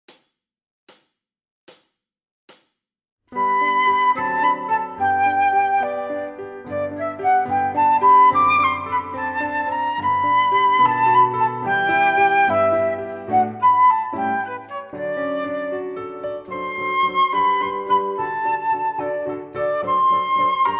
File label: Full Flute